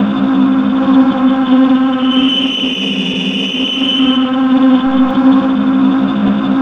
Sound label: brite buzz, amb. feedback; Spectrasonic Distorted Reality 1